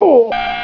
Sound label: pain; sven; player; helmet